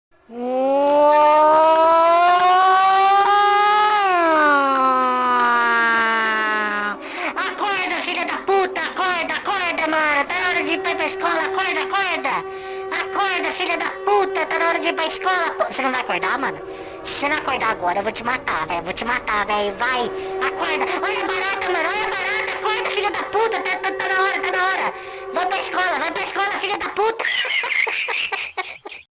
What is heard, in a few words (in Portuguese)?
Despertador Humano